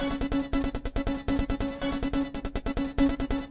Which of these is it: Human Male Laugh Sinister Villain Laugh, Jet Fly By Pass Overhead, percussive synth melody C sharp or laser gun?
percussive synth melody C sharp